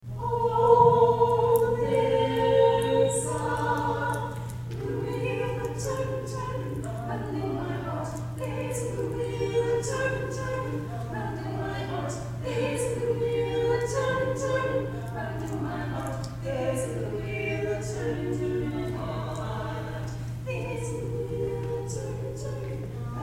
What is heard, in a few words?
Spiritual Quartet